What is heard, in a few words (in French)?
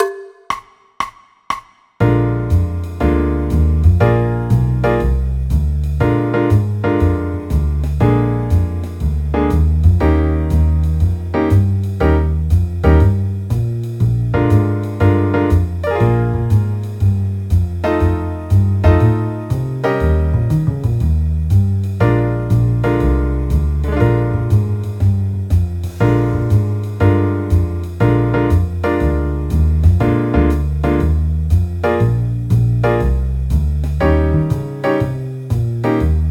Blues grille; orchestre a la maison